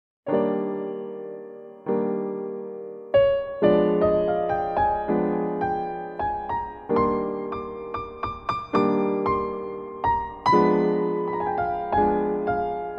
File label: bluesy soft piano